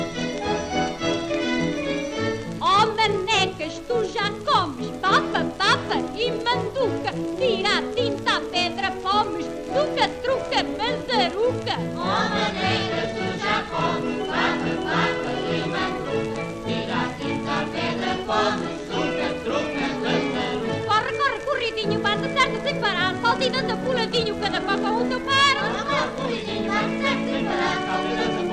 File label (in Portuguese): Corridinho